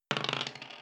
dice

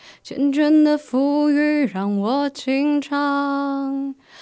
Alto

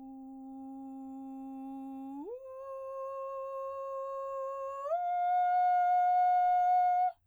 long straight u

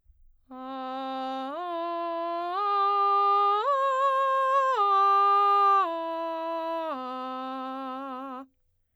arpeggios straight a, vocalset

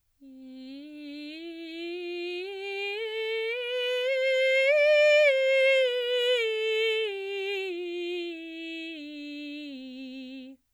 scales straight i

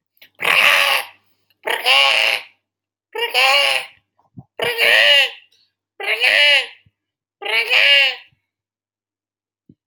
Animal Wild animals Frog Croak, vocal imitation

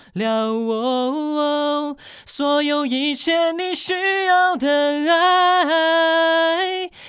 Tenor

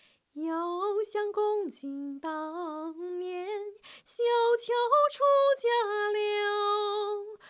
Soprano

